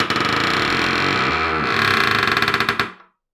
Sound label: Door Creak